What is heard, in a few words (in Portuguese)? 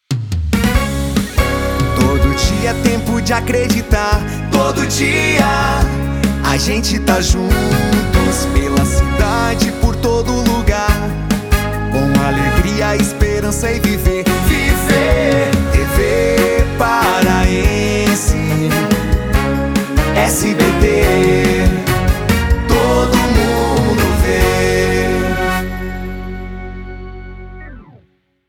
Jingle; SBT; Todo mundo ve